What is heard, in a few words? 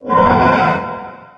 controller idle